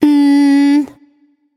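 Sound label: tongue, non-verbal; smite; voicepack; tiamat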